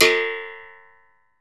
Berimbau; Berimbau Pallet

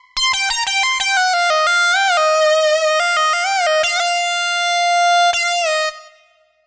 Lead Synth Lick; Dr. Fink's Funk Factory

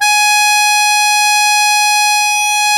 MUSETTE G#3; Historical Instruments; AKAI; USB Soundscan vol.23

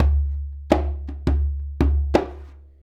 Djembe; Sonic Foundry (Sony Creative Software); Essential Percussion; Drums

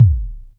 Kick, Roland MV8800; PLUTO KIT

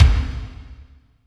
KICK, Tekno Industrial; East West

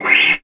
SLIDE, CELLO FINGER; Xsample VOL-5

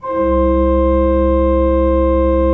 SWL C1, Cathedral Organ; Propeller Island

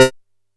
C4, Bass; E-MU Producer Series Vol. 6 – Analogia Project; Boom da Bass

